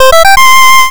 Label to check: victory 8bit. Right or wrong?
Right